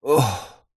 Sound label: male middle aged exhale sigh moan